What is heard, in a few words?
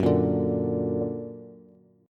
Gb Augmented Bass; x,9,8,7; Gb+ strummed